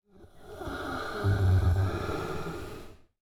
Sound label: sleeping; Rust